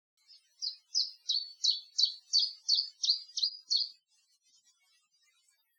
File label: song, Armenia; Vorotan; Armenia2018